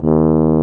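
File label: brass, C Tuba; Roland L-CDX-03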